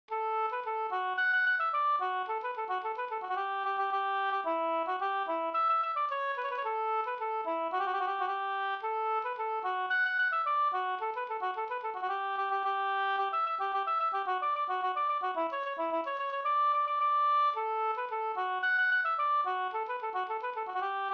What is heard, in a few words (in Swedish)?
polka polkett; e durspelaren Alfred fr Mangskogen